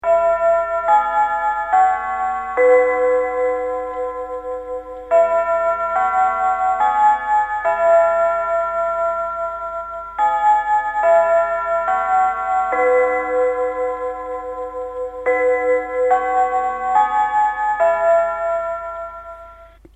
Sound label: chime